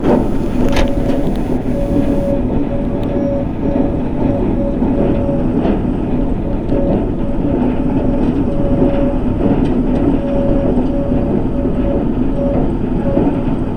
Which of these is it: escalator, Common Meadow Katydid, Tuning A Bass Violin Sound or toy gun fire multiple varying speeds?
escalator